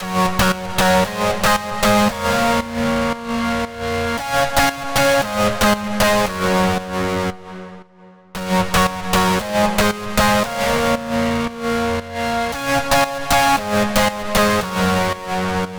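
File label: F minor, 115, Lead Synth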